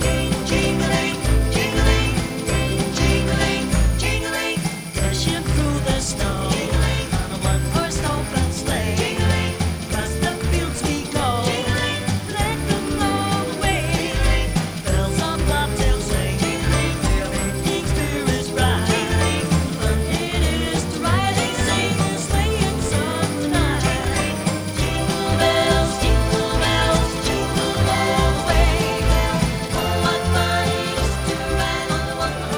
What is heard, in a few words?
xmas jingle bells